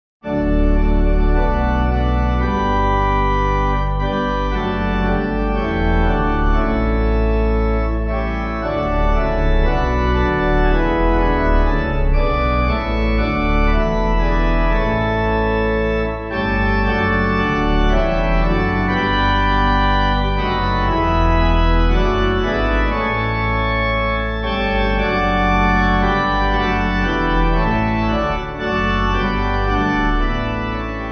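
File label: Pipe, Pipe Organ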